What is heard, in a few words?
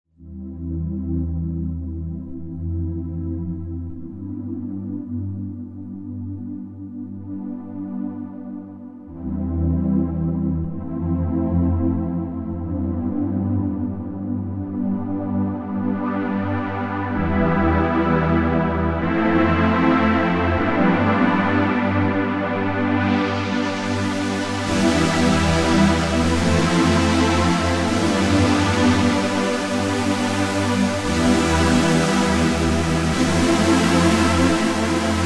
Classic Pad, yamaha sy77; pads and textures; sound bank is delivered as a SysEx file